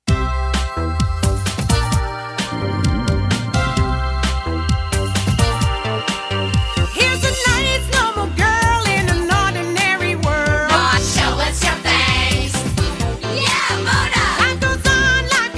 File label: w vocal; mona the vampire